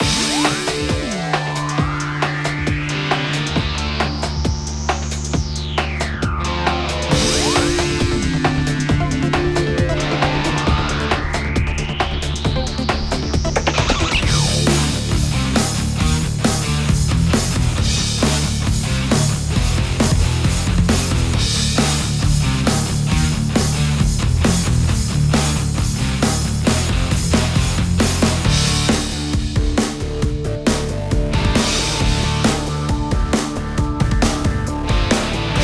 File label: trombe boss; Magic GameClient